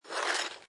Snow And Ice Ice Skate Slide; Weather

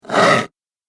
Horror Scratch Wood; Wood Sound Effects

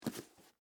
Boot Pick Up; Foley; Dressing; Human

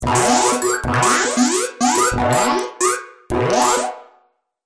Little Bits Of Laser, laser sound effect; Sci-Fi

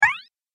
Game Light Jump, Video Game Sound Effects

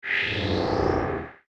Transporter, science fiction sound effect; Goodbye Terra Firma; star wars / trek style games

